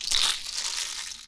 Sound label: box rattle; Kenya Box Rattle